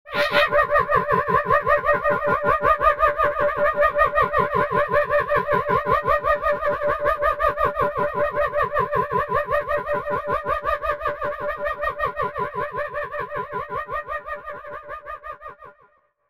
Psycho hyena laff

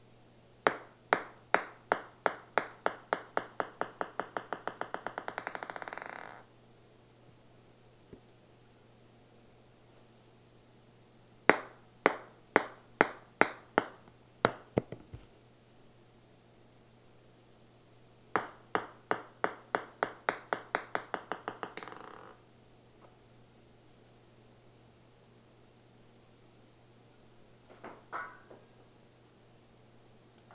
Bouncing Ping Pong Ball

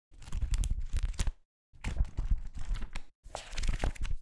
Book Page Flipping

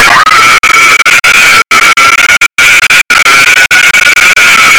Glitch Scream

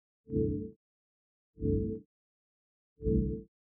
Ui Bright Star Sytrus Arpegio

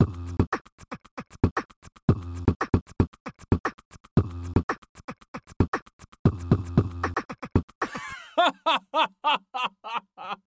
Beatbox Loop With Samples

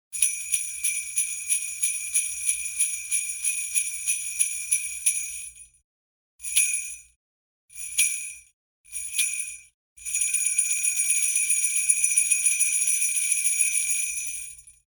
Sleigh Bells

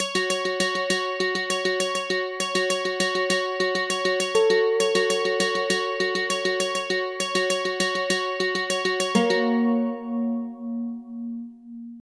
Plucked Strings

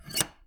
Metallic Latch Release

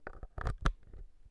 Jack Plug

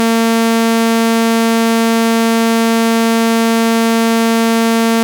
Saw

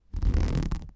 Scratching material

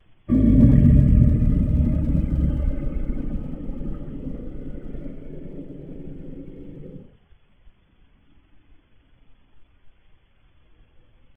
Dinosaur Dino Growl

